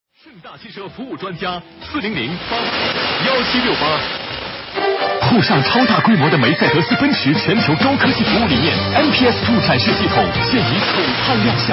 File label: Hit FM